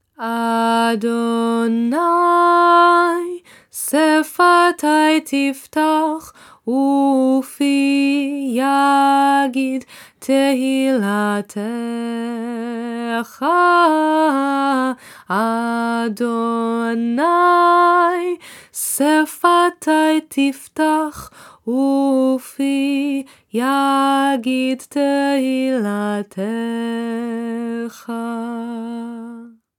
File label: Adonai Sefatai Tiftach Melody; high holydays childrens family services